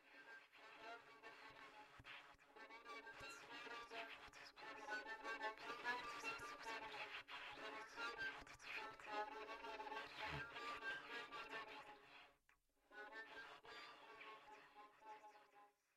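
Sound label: violin trumpet mysteries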